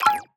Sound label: Select Highlight UI